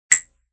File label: Cheep; Chirps